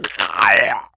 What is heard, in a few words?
die zombie; cstrike